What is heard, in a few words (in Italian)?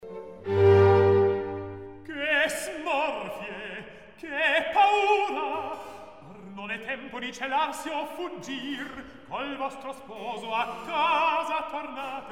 Recitativo; Che smorfie che paura; Mozart; La Finta Semplice